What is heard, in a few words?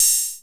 Hihat Open Virtual Steel, drums